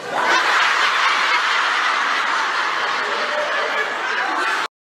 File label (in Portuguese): risada plateia